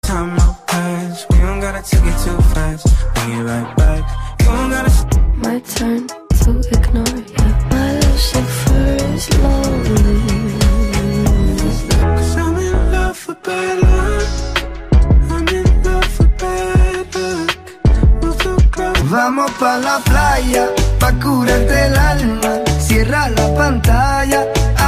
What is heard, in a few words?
cool hollywood ringtone